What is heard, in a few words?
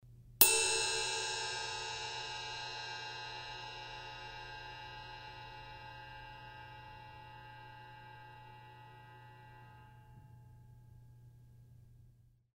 S Rock Ride Crash, cymbal